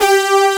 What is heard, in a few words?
G2 POWERCS, HIP KITS